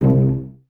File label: CES C 2 Pizz, Cellos, String Ensembles; Miroslav Vitous